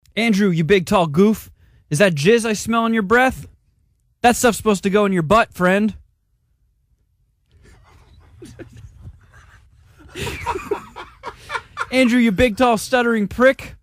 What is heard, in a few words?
rap, dry